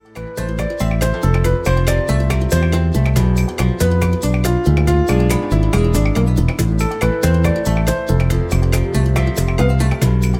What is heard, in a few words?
Happy Arabic soundtrack; MIUI12